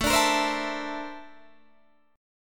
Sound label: Bb Major Sharp 11th A, chord